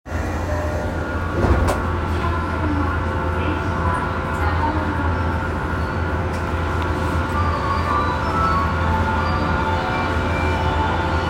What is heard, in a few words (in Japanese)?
日比谷線上野南, train sounds